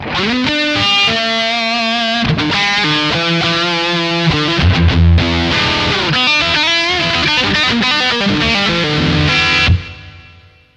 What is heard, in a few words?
p90 dist bridge